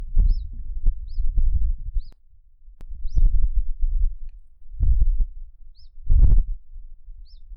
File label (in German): zilpzalp